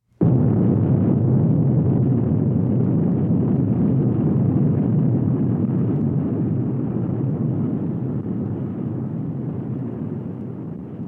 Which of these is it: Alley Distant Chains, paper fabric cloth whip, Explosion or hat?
Explosion